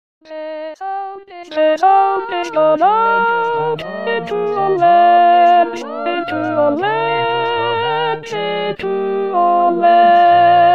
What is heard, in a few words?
alto; their sound is gone out